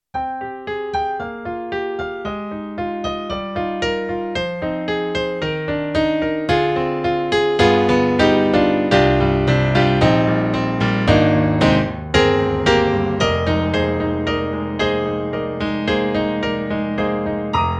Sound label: SD-80 Piano; EDIROL